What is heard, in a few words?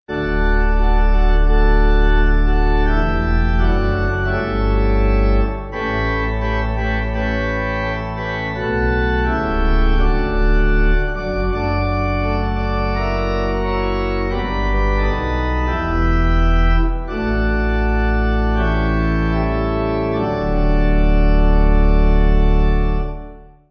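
Pipe, hymn backing; Jesus My Saviour; Hanford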